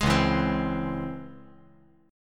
C sharp Minor Major 7th; C#mM7 strummed; JGuitar

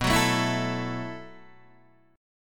B 7th Suspended 4th B down Guitar DADGAD

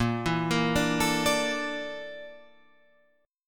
Bb Major 7th Flat 5th Bb Guitar Drop D

